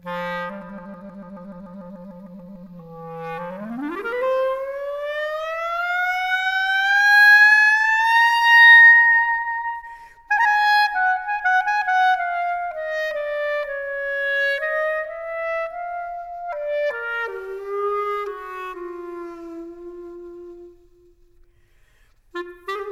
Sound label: Clarinet; EDIROL; R-09HR